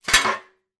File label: household metal dustpan put down